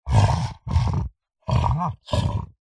horror monster playful curious caring grunts groans